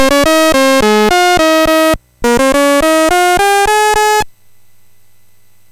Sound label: MOS6581R3, GAMES; Spikes Peak